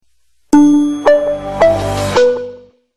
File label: Power Down